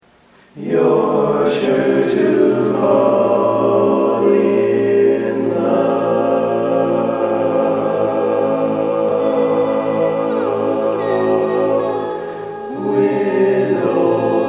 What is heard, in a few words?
All Parts, barbershop tags